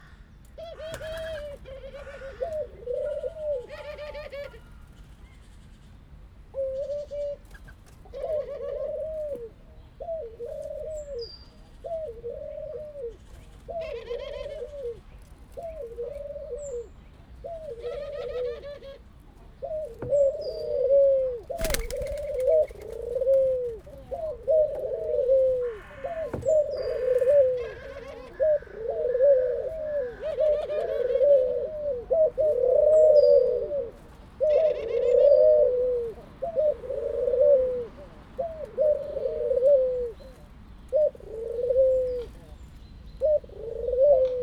egy szárnycsapás is, kacagó gerle; debreceni zoo 2019

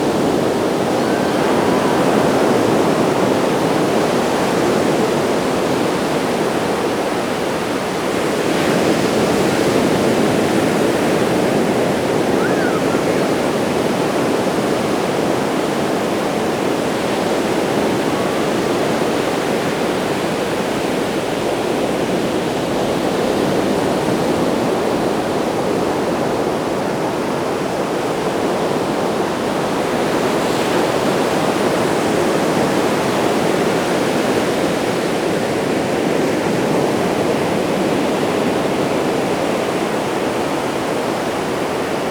sikolyok

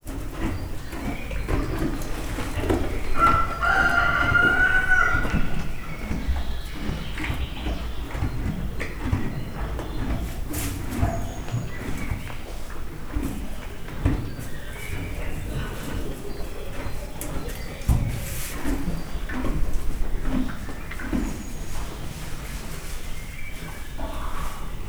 istállóban eszik, kakas, kakukk, pulyka, magyar parlagi szamár; miskolci zoo 2009

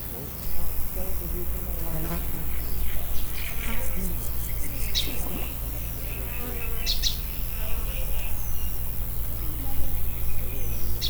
légiforgalom, rovarok; termeszetben; tiszababolna

